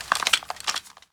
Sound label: suit, footsteps; player